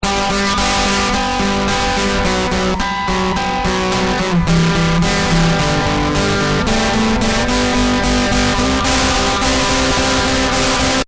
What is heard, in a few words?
riff